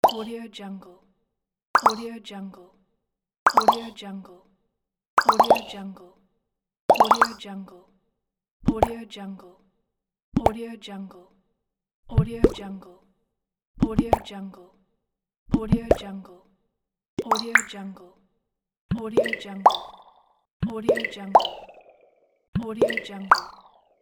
bubble pops; giovanni-studio

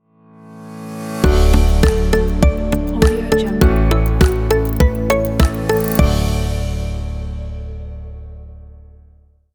calm innovation logo

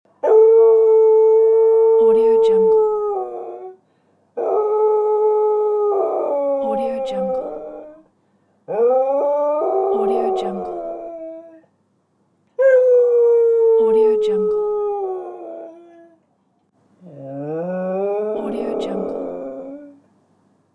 dog crying

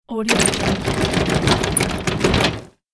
tugging the door handle; catsoundstudio

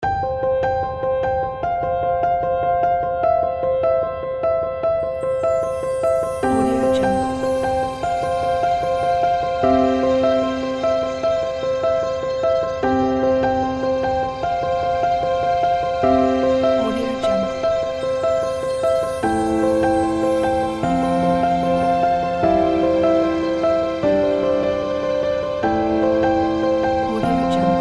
blossom spring piano background

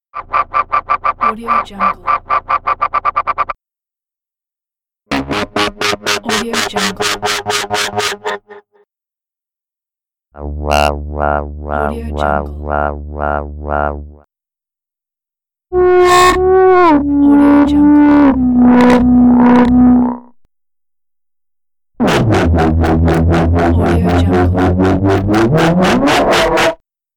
wobble production elements; audioefx

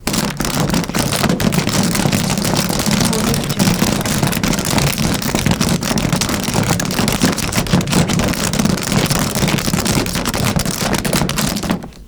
texture ski boot shuffles; soundmorph

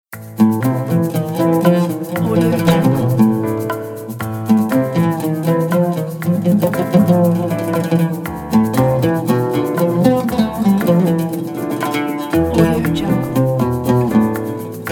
oud and flower; tanerdemiralp

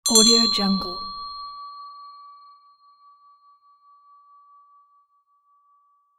pretty bells

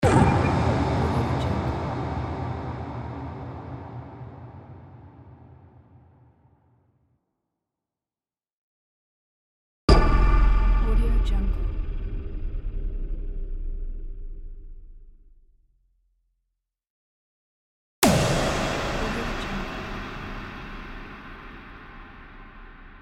large powerful impact

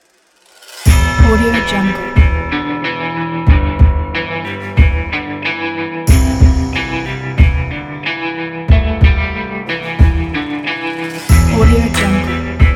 dark country

